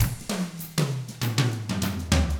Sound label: FUNKY, Drums